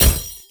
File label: expand; Amatic; Diamond Monkey